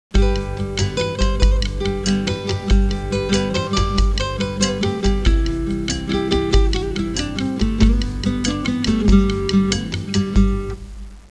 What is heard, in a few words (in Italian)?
suonerie musica hawaiian